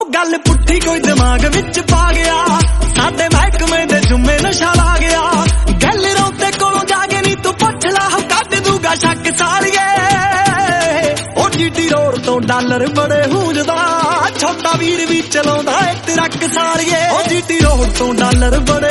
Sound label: Punjabi Song